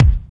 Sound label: KICK, Tekno Industrial; East West